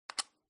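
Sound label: checker place, backgammon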